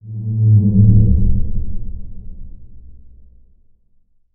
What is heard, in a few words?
moan, ambient outdoor